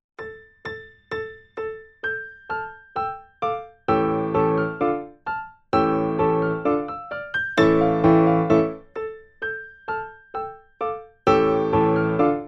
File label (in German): beide, Vierhaendige Uebungsstuecke; Playbacks; Diabelli